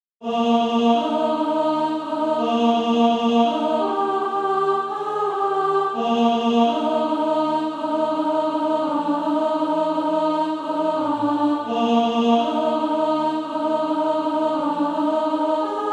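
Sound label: alto, SATB Practise Music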